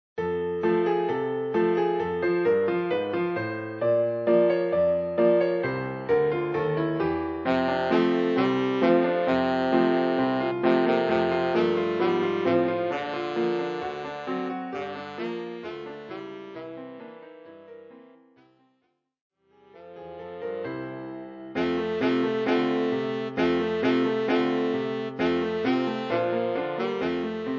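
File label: tenor saxophone; Jingle Bells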